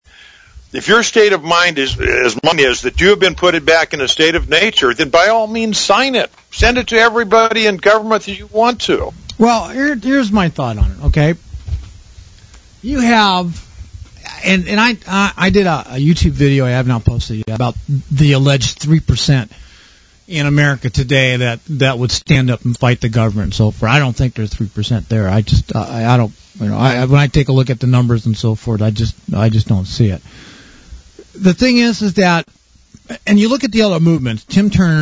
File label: music stream